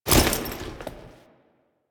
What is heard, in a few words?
Foley Step; Warframe; Kahl